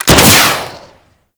Skiff Missile Fire, weapons; Grn Skiff Missile

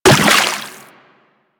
Run Water, Footsteps; Warframe; Teshin

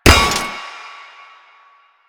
Tank Shield Impact; Warframe; Lasrian

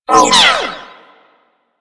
Energy Projectile By; Corpus

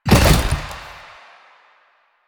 Collision Fast, Motorcycle; Motorcycle Ninety Nine

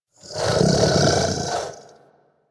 Kubrow Vocal Snore; Warframe; Hunting Critters; Orokin Kubrow

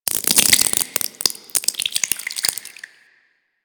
Taunt Flesh; Warframe; Proto Infested Ancient; Abilities

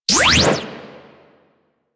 Sicarus Prime Fire 2D, weapons; DE; Warframe

